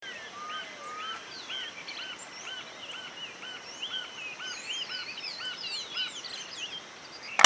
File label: Carpintero Real Verde, Green-barred Woodpecker, Colaptes Melanochloros; cantos